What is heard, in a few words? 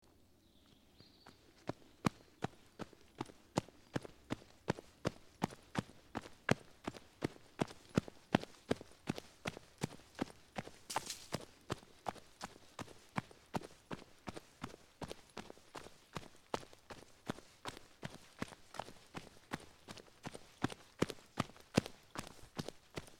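running on dirt road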